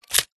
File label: Mechanical